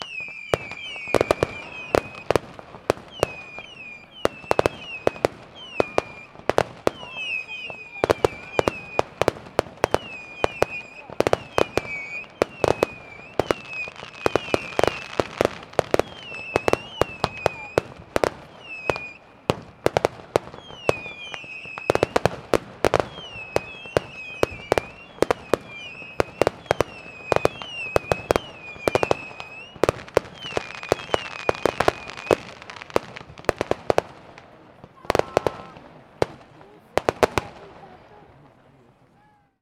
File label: Fireworks Impact